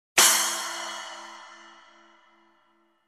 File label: Single crash hit